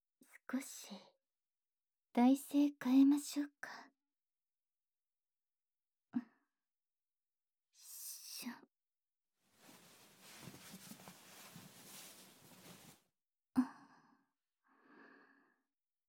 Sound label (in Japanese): 膝枕で耳かき 反転